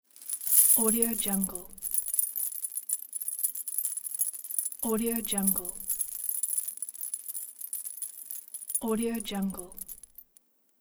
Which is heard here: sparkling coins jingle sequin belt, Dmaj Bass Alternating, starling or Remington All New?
sparkling coins jingle sequin belt